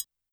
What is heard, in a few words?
Triangle Mute, YAMAHA RM1X